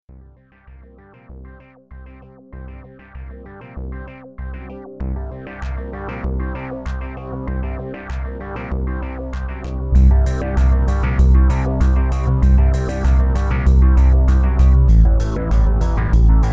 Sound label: concept music